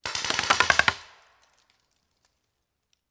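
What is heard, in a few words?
Paintball Gun Impact; Sound Ideas; Guns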